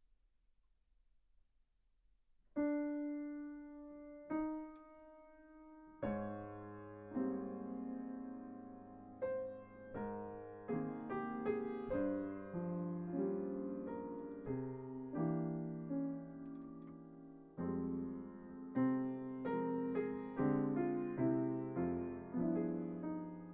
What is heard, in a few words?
hammond piano